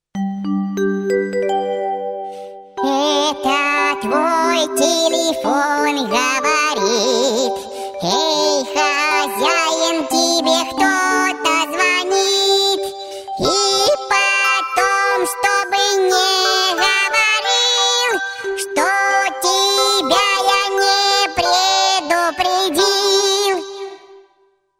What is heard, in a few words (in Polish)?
ruski dzwonek